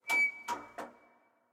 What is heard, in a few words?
Creak Med